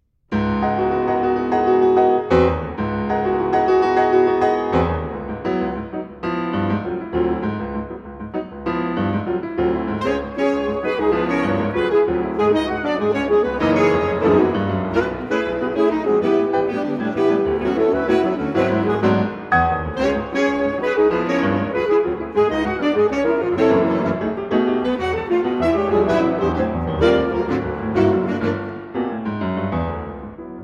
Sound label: piano quintet